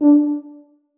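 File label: tuba staccato c#3; Sonatina Symphonic Orchestra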